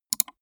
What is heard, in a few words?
gaming mouse left click single fast soft; maschinen; buero